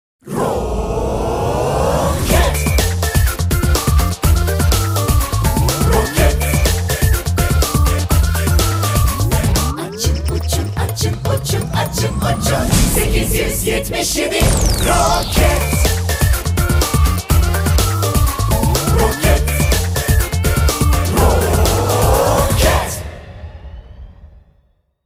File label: jingle